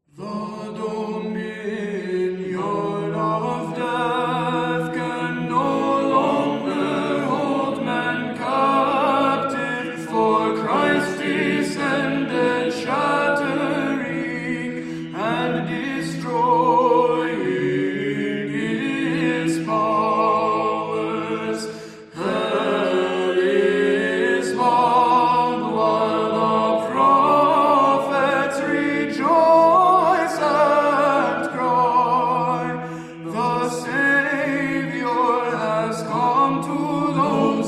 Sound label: Byzantine Chant; Resurrectional Kontakion Tone 7